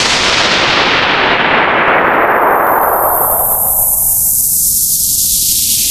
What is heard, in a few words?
Noise Swept Noise; Ableton Live 12 Lite; Vector; Sprites